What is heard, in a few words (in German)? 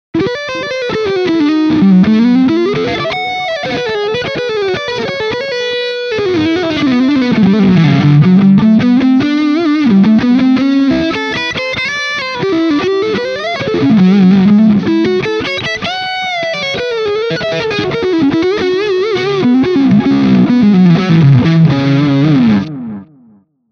Leadline Overdrive Hals